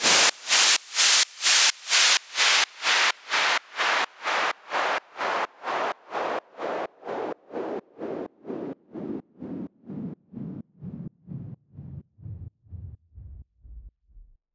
Fall Side Chained

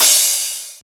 Crash

